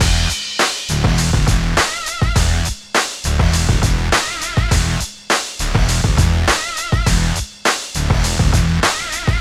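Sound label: 102 Pop Electric Combo E minor; Digidesign; Pro Tools; Pro Tools Essential Loops; Pop Rock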